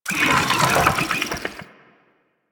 Infested Fishing Fish Caught

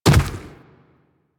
Inf Medium Land Dirt, Infested Footsteps; Warframe

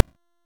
Shield Pulse; Enemies; Guardian; Warframe; Eximus

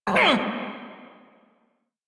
Pain, Male; Railjack Crew; Perrin Sequence

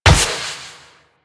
Wall Run Step; Warframe; Wraith

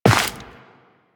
Step Dirt, Footsteps, Walk; Warframe; Tenno

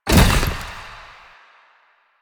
Collision Fast, Motorcycle

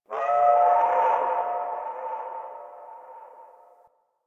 Texture Bird Small; Warframe; Infested Eris; Ambience; Outdoor

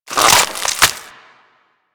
Limb Stretch; Warframe; Infested; Emissary Ancient Tank

